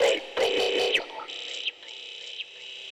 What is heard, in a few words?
Big Beat